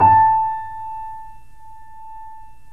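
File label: A4, Bösendorfer, 3D Pianos; E-MU Producer Series Vol. 5; 3-D Audio Collection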